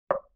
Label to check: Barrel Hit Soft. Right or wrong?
Right